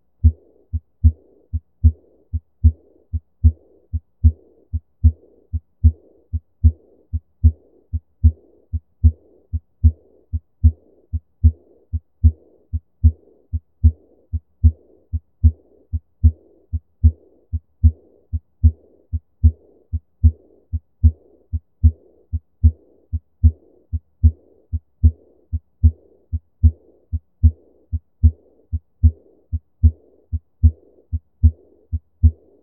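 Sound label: Aortic, Early Diastolic Murmur, Sitting, Bell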